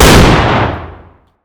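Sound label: fire distant 50bmg rifle interior; gmod; arccw uc commons attachments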